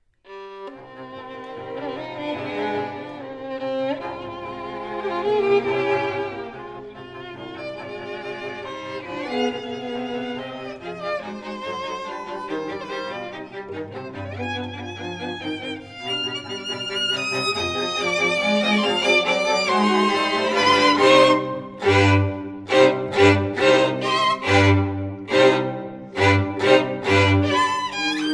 String Quartet First Violin; classical violin; Beethoven Op.18 No.4; Hayes String Quartet

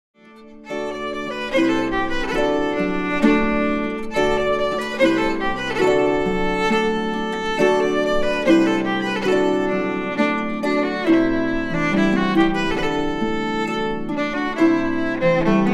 Harp

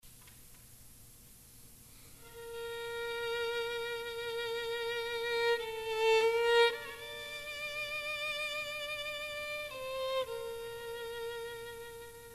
violin; Ave Maria; F.P. Schubert

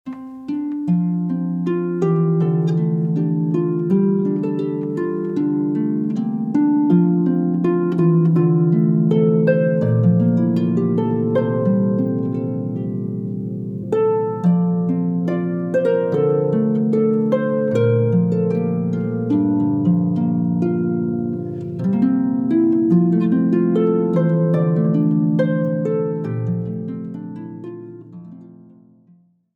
Harpists; Classical

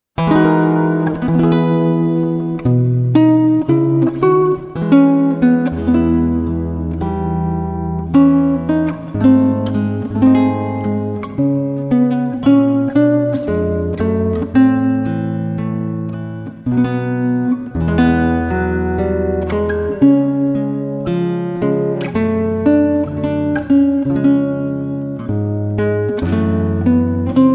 classical guitar; Tchaikovsky

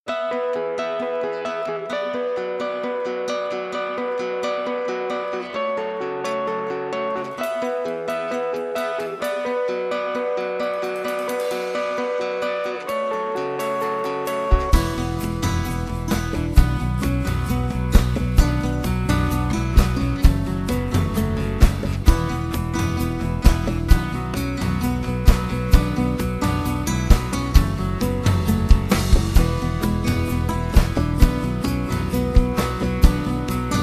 latin guitar

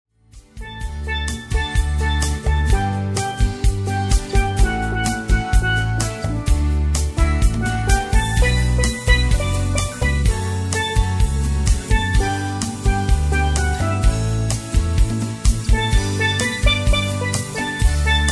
Medley, Steel Drum

